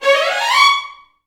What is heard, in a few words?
VI RNMI C#5, Advanced Orchestra; Best Service